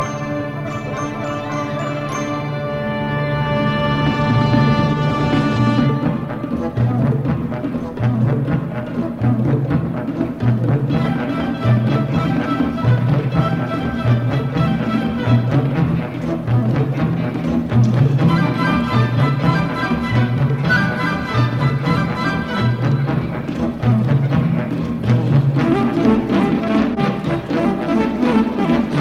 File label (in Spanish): nuevo clasico tono de llamada